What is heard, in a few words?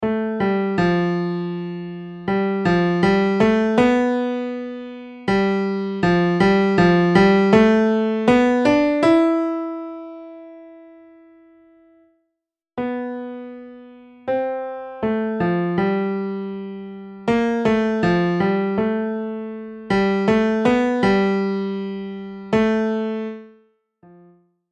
melody